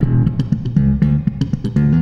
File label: Bass wet